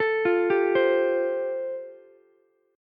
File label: G 7th Suspended 2nd Suspended 4th G chord, Ukulele; G7sus2sus4 arpeggiated